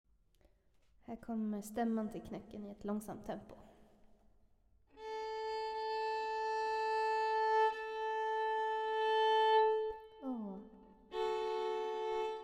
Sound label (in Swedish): stämma långsam; Knäcken